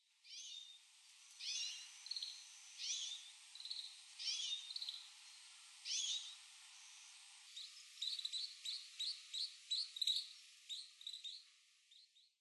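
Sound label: Oriental Greenfinch, Muroran; kawarahiwa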